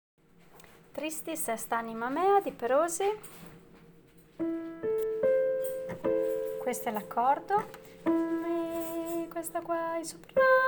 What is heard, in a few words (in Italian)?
tristis contralti